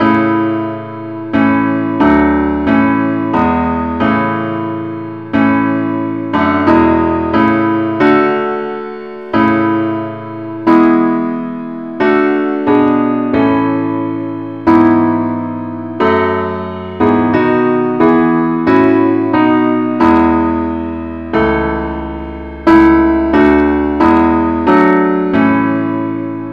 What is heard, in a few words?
parts only, key a; christe Sanctorum